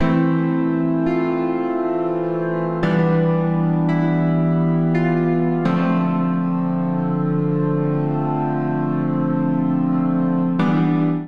Past Keys A Minor 85 bpm; Accompaniment; Tonal; MIDI Clips; Drive and Glow; Ableton; Factory Packs

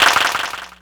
Clapbox Pitch; Ableton Foundation FreePacks

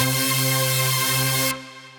Xtreme Lead, Synth Lead; Ableton; Factory Packs; Build and Drop

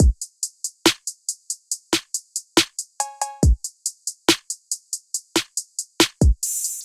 Kit, Trap Drums; Diverge Kit; Ableton; Factory Packs; Trap Drums by Sound Oracle

